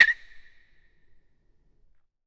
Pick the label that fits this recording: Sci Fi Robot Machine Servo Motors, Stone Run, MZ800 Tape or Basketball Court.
Basketball Court